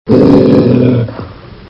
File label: front roar, tiger